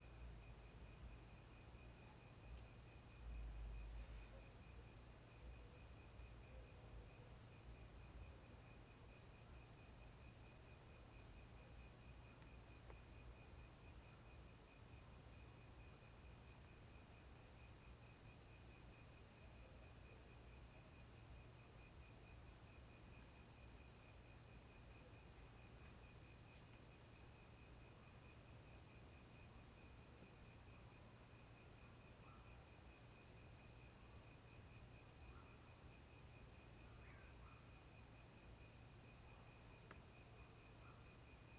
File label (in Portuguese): Ambiencia matagal a noite com grilos, morcegos e cachorros longe; ambiencia rural